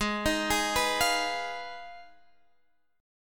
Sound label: G sharp Minor 6th G sharp down